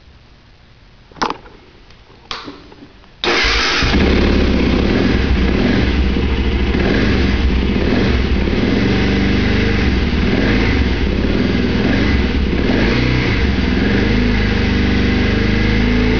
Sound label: Muffler, Motorcycles; Dakar mods